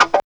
GTR STB

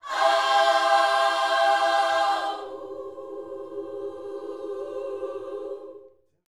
YOUTH CH PHRASE, Vocal Planet Gospel; Spectrasonics; Vocal Planet Vol.1 Gospel

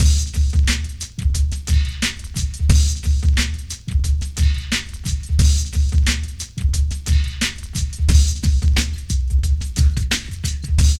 PHAT LOOP; E-Lab; X Static Goldmine 2

